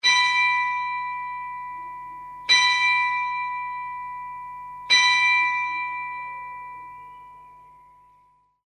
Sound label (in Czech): zvon; cecilie; zvonohra tony